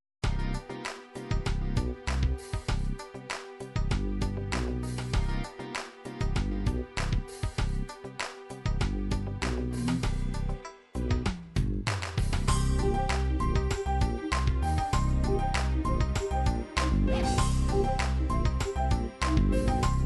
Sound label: techno trip hop, midi